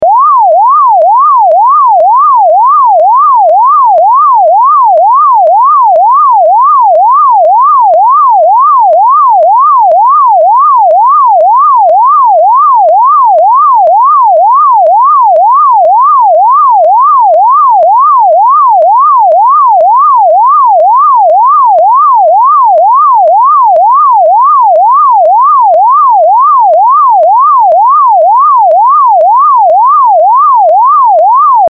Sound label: Siren